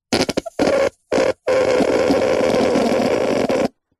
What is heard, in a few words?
leisure whoopee cushion squeeze fart long weak